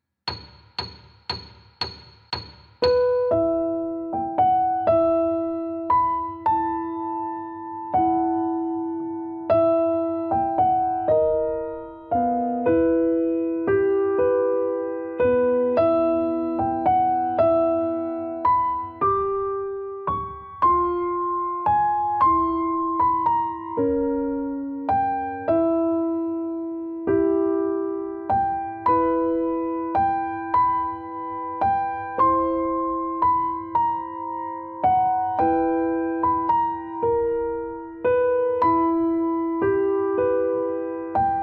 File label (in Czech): 115 metronom, klavír